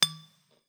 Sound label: Bottle Glass Hard